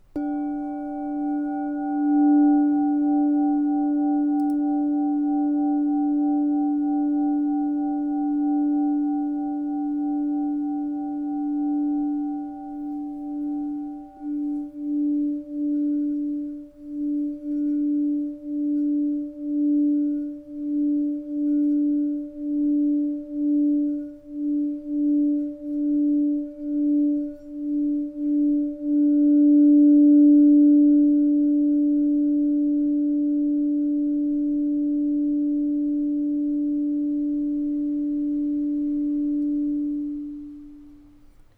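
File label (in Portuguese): TACA D4, instrumentos de quartzo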